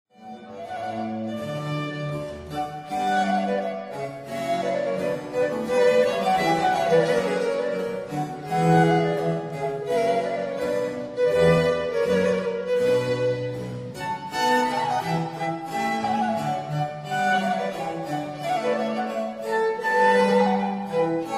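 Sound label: for 2 violins & continuo Bourrée; L'Espagnole (La visionnaire); Les Nations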